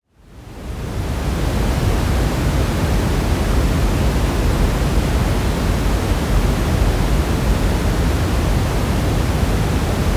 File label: noise brown